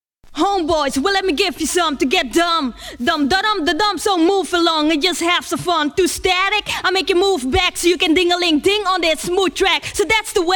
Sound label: Acapella